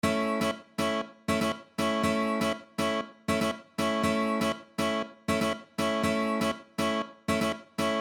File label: Rhythm, guitar